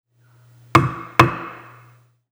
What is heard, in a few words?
PO Gavel